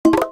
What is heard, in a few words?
Message; Grindr